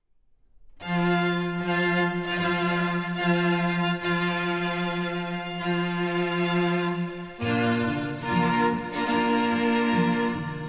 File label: Bridal Chorus, string quartet